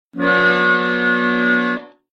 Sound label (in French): accordeon; Quiz